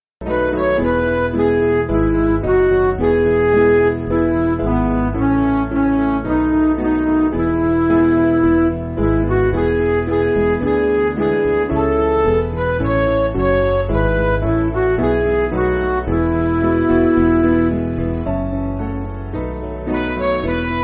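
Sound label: Music Backing Files for Churches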